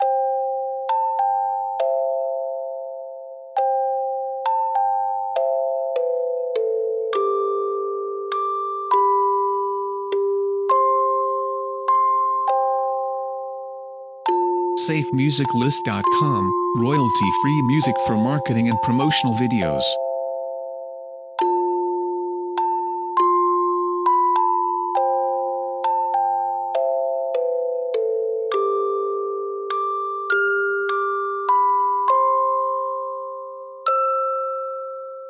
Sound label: Silent Night Music Box